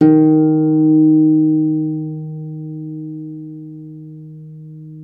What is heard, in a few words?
CELTIC HA, Celtic Harp